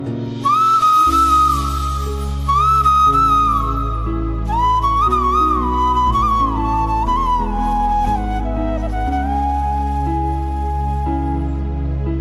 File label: Flute; Zero Movie